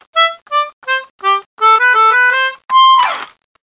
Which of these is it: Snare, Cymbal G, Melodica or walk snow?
Melodica